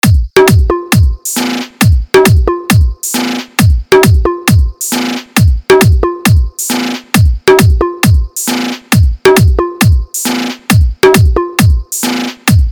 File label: Vibration Competition Beat